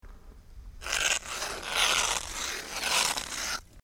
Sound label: Ice Scraped Off Windshield.